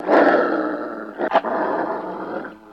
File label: Werewolf Growl Death